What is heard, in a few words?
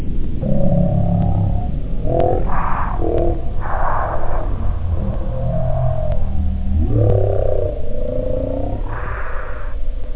vocal